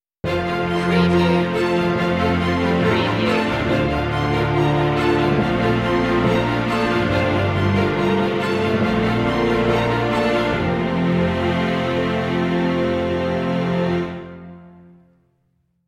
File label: soaring orchestral logo